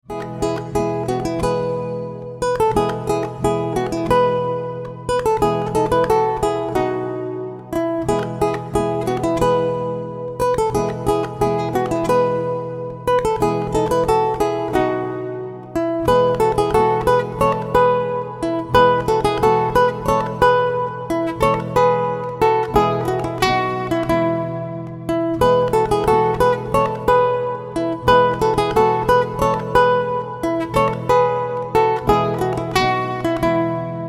Spring Four Seasons Classical, acoustic guitar

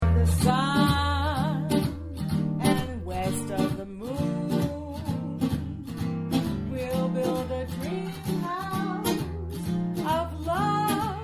Jazz Duo

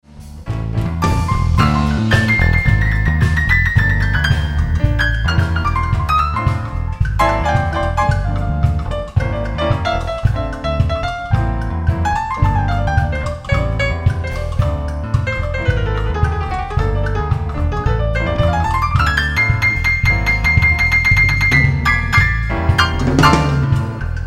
Jazz Cafe, dance band; Entourage

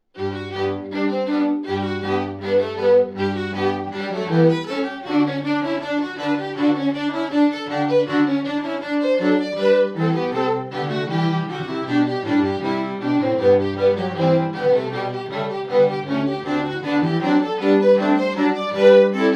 String Quartet; classical string quartet

